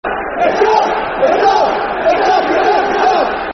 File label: Attack, Attack, Football Chants; Stoke City; Stoke City Scfc